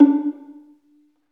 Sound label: STR Violin, Solo Violin FX